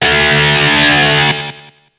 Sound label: Evacuate